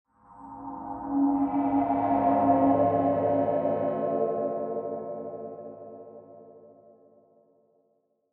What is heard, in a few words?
Horror Feedback Swells Dark Metallic; Electronics; Blastwave